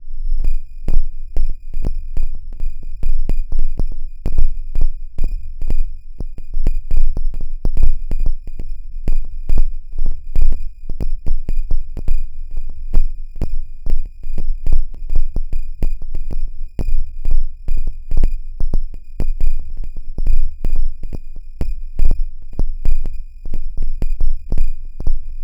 Cm72 Zone Ambience

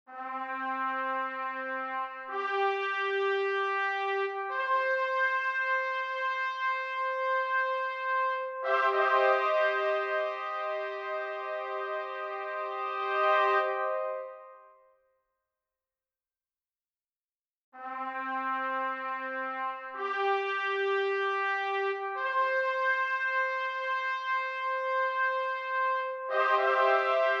Trumpet 54 bpm; Zarathustra

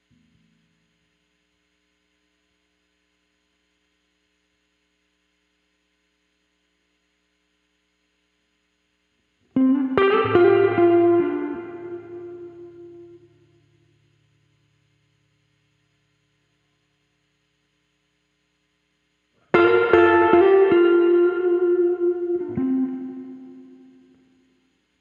surf guitar

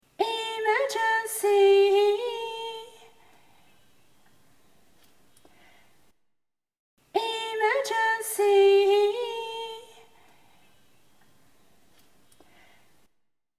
Emergence Sea with MMT (Clean recordings)

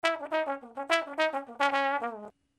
brass loop

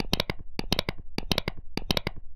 contact perc

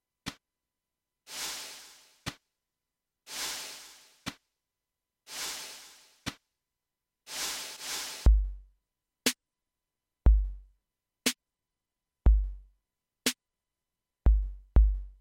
Slow drums

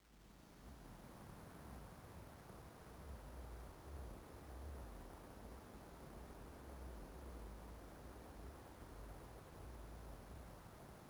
nightbus ambience and rhythm

